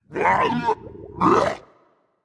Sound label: clear throat; Smite; bakasura